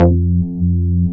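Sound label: wave bass F2, analog bass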